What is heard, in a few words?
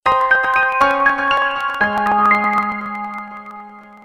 Glitter Sms